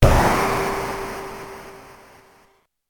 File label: Thunder; Illusion City OST